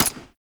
Assault Rifle Mech; weapons; Warframe; Grineer

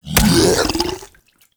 Tendrils Digest; Warframe; Infestation

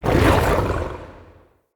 Growl; Orengall Quest; Banneret Ending

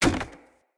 Sphere Polearm Medium Impact Wood Hollow Large; Player; Environment

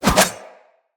Pole Arm Spin Whoosh; Weapons

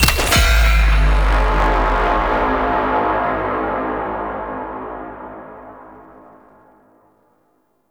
Tower Activate; Warframe; Bard Quest; Orokin Tower

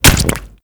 Goo Gun Sack Land Large; Warframe; Grineer

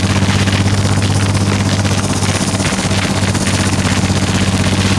Ice Hijack ATV Loop; Warframe; Corpus

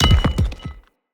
Bomb Drop; Encounters; Ode Pollution Tower

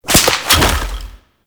Bunraku String Drop; Warframe; Warframes; Koumei

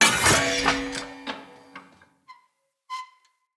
Light Mirror Hit Move B; Puzzles

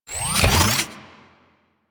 Plasmor Equip, Shotgun; Arca Plasmor; Warframe; Weapons; Corpus

